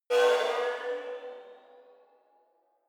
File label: sci-fi vehicle turbo activate; raumschiff